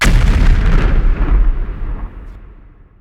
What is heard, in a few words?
Fireball Explosion